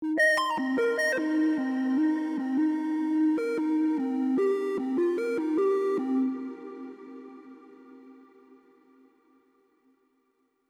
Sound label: Overlay Tone; Benefactor